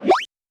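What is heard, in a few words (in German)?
für ein modernes tetris ga, puzzle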